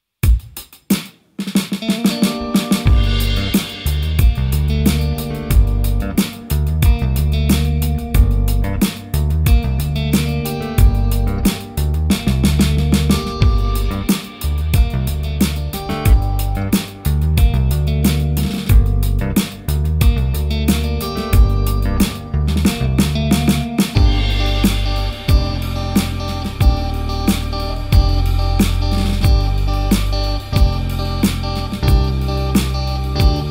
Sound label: Chapman Stick